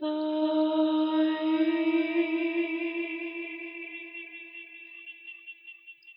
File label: mermaid song